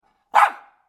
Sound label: puppy bark